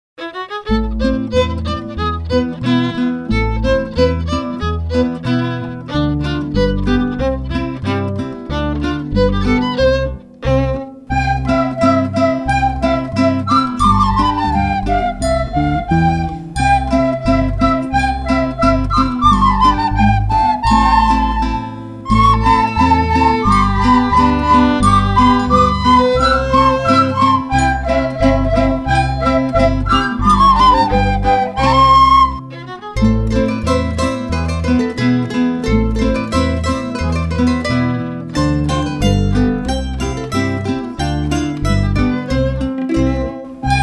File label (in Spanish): Marcha Turca